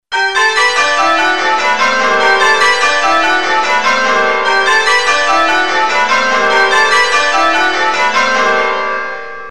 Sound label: Rollercoaster, changeringing